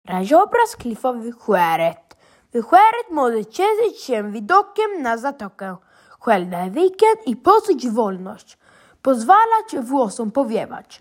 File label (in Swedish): Polski; Klipplandskap i Skäret